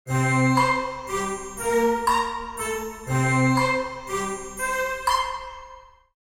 Cartoon funny transition comedy dramatic

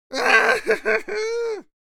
Crazy male psychotic laugh

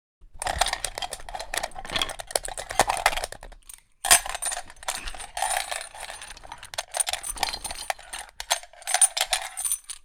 Sniper rifle brass shell foley movement

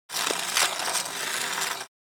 Ice hockey stick scrape on ice

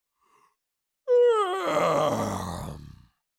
Cartoon lion voice yawn

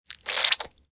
Hair gel bottle squeeze

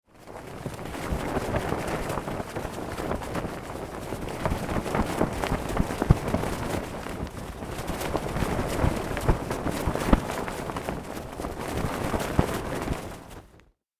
Ship flag flapping flag pole no wind

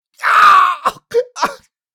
Male yelling in pain screaming loudly

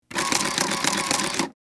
Cash machine printing receipt

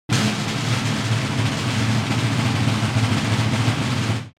Drum rolls long

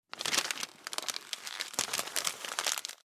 Uncoated paper handling

